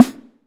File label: Vermona Snare; techno drum fx; Analogue DrumHits